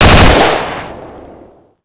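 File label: grunt machine gun; hgrunt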